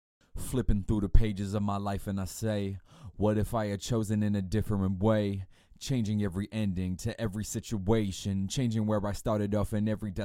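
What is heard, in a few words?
hip hop, 90, A minor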